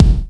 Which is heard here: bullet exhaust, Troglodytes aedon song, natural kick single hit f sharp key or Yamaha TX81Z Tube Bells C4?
natural kick single hit f sharp key